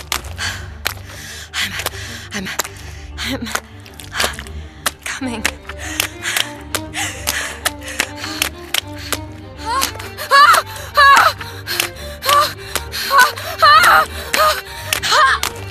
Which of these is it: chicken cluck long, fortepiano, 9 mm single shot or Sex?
Sex